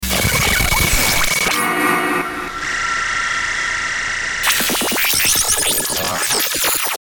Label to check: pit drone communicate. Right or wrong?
Wrong